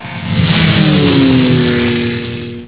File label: brrrm